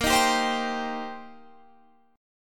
C Minor 7th Bb

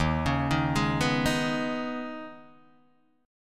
Eb 7th Suspended 4th Sharp 5th, Guitar, Open D

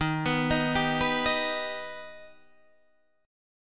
D sharp Augmented, Guitar, Standard; arpeggiated; 11,14,13,12,12,11; JGuitar

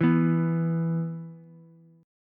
E 5th E down Ukulele; strummed; JGuitar

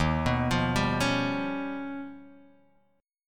D sharp Augmented 9th D sharp Guitar DADGAD

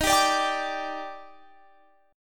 Eb Major 7th, Guitar, Open F; EbM7; strummed

